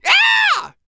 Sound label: YELL, MALE HUMAN; ILIO; Vocal Planet